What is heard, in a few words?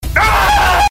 roar